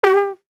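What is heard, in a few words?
FX Fakie, Beat Box; aawaz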